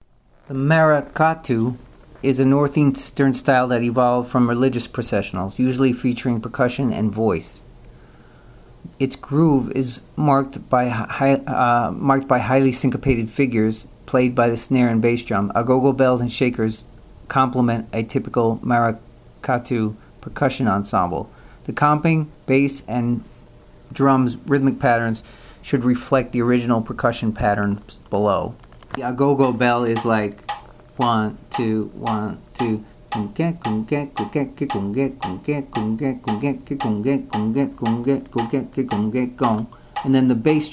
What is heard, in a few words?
maracatu; brazilian styles